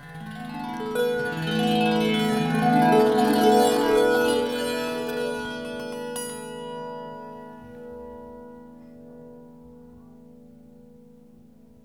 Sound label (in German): Tenor Tao-Leier Klangbeispiel